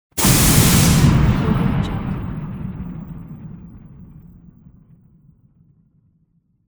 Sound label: steam release